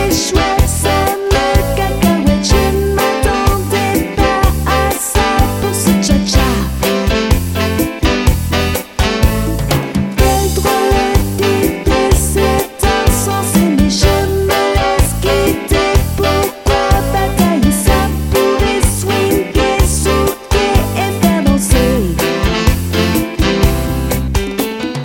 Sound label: chacha